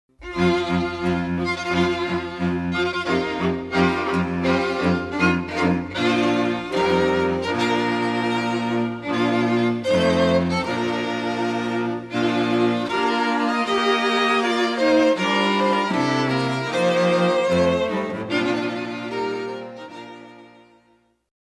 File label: bridal chorus